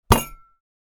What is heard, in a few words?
Linear steel table hit impact plates glasses